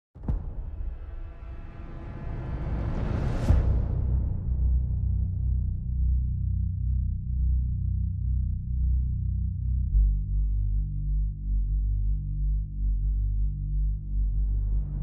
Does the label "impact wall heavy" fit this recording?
No